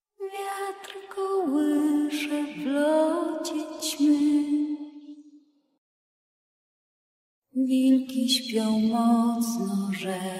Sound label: vocal only; the witcher 3 blood and wine